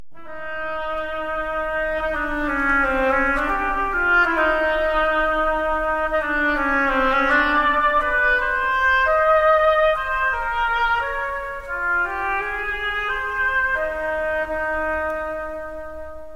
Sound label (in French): canard hautbois